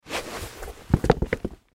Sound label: Shoes Take Off; Shoes (Sound Effects:Objects); Blastwave; Foley